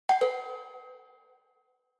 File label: Cowbell Multi Reverb